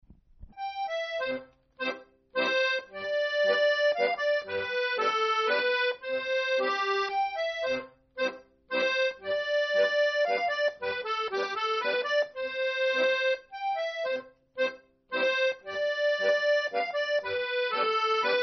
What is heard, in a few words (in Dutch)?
Landler